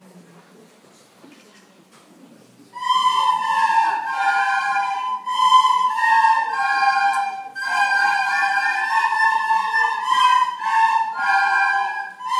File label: concert yr3 recorder